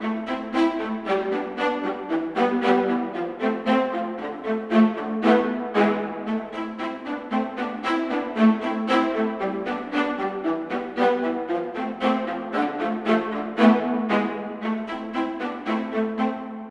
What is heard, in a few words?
viola; виола